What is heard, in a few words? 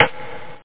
stick